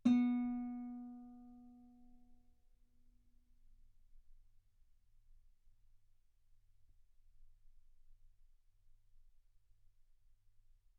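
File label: Guitar ff B string B3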